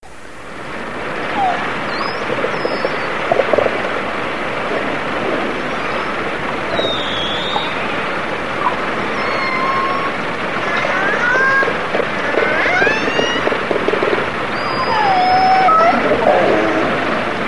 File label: orca; walvisstrandingen